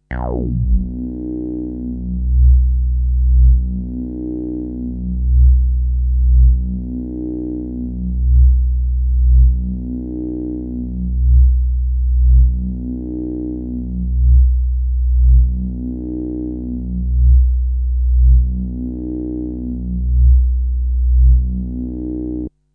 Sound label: buzz; Buzz2 26s